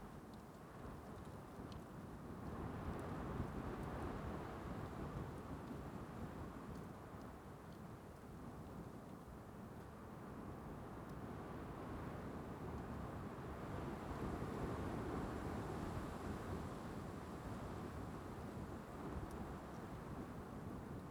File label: ambience field windy; Rust